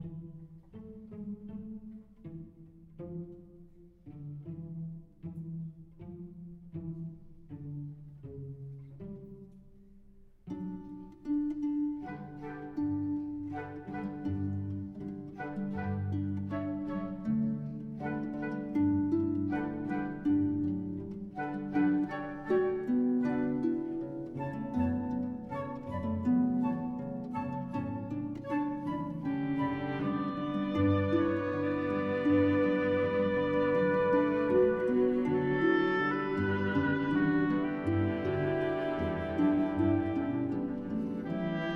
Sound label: No Vocals; Assassin's Creed Syndicate